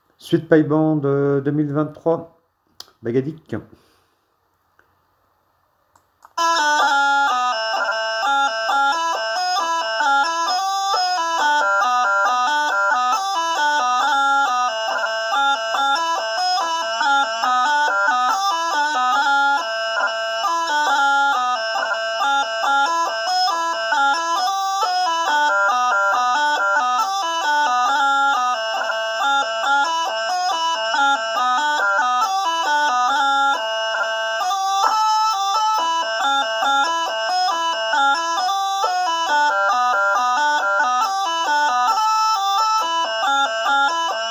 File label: Suite pipe band hornpipe jig slow air reel